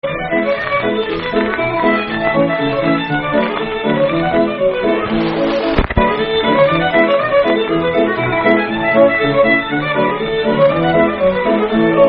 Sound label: Hand Organ Hornpipe